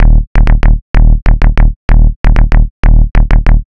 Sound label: techno bass swing; instruments; loops